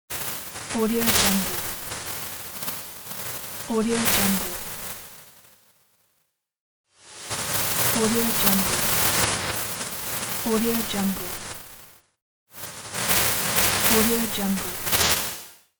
tv noise; intro-boom

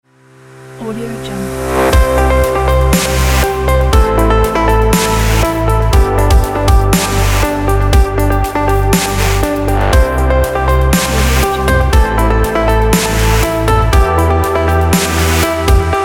future bass

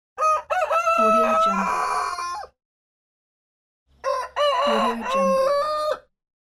cock crowing

